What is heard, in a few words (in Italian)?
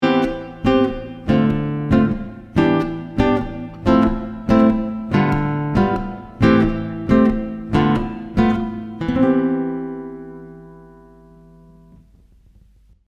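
Esercizio, Chitarra sola, pratichiamo con l'accompagnamento; Guitar Club Maggio 2025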